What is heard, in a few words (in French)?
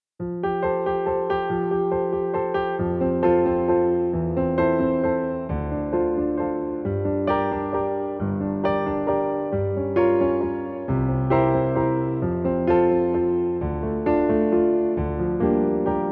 Version instrumentale Sans choeurs; Les Choristes